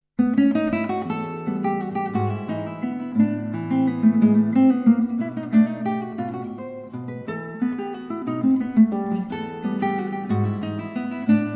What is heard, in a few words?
Venezuelan Waltzes for guitar